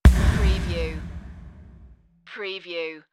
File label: Velocity Snare